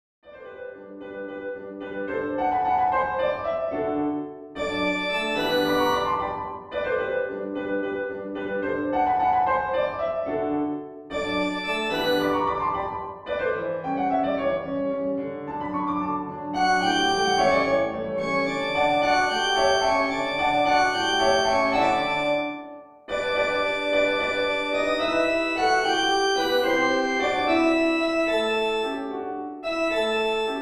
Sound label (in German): Klavier Sopran; Zauberfloete; zu Hilfe sonst bin ich verloren